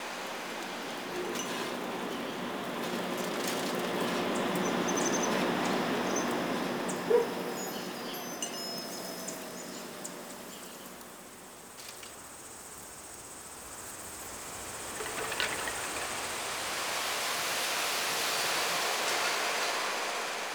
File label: Summer Exterior Small Bed Loop, Ambience; Warframe; Nineteen Ninety Nine